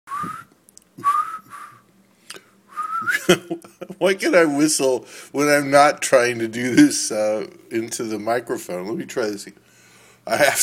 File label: whistling a tune